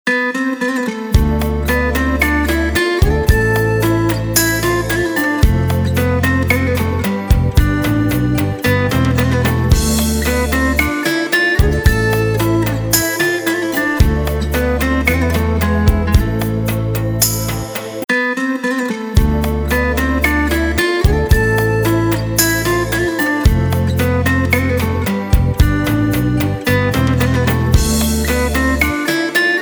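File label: violin melody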